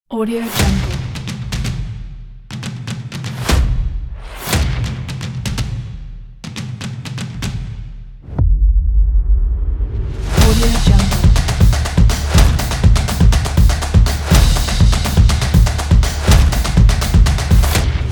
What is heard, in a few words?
drums